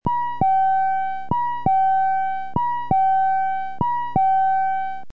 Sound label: Double Chime tone